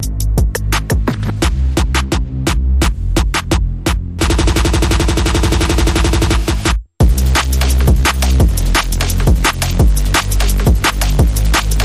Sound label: Double Wobble, Rhythm